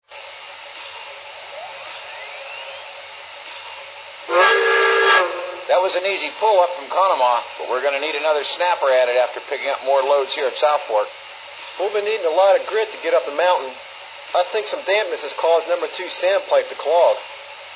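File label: m1a freight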